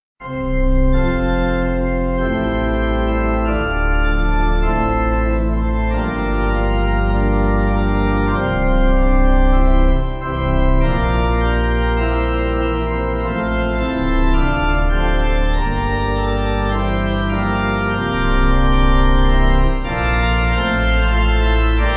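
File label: Pipe Organ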